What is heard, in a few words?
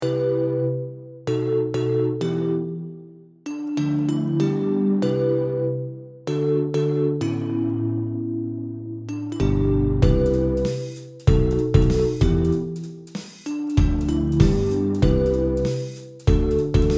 TV inst